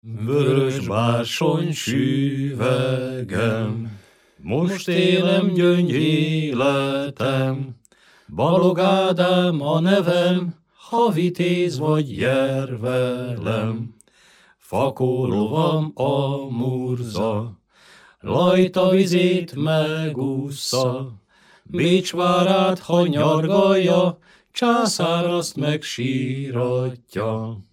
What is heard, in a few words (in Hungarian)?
nepdal; voros barsony suvegem; nepdalok